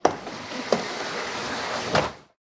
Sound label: door open; Golden Crayon Sounds